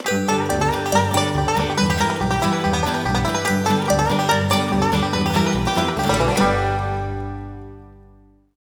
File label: Music Chicken; Legendary Hat